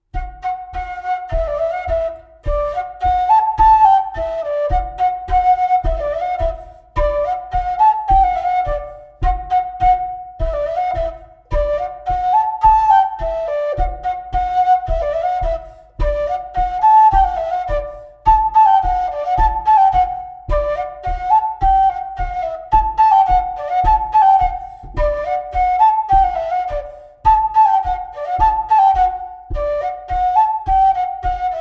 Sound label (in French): Bourree; Recueil De Raoulx